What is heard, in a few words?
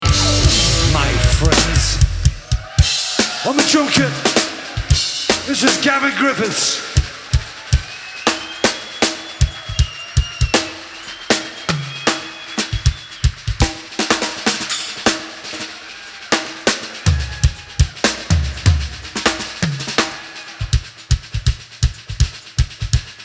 drum solo